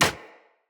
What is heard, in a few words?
Hunter Bow Impact Default, Environment; Weapon Impacts; Player; Bows; Envoy Hunter Bow